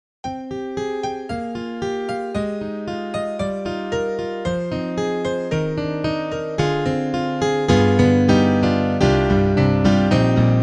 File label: YAMAHA EX5, Rocking Bose